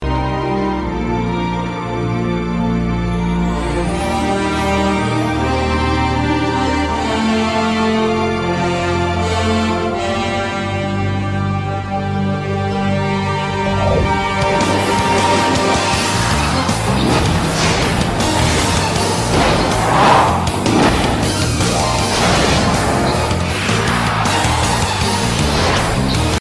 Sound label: samsung ringtone; jet